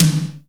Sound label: TOM, Drum Dry; East Collexion; Drum 1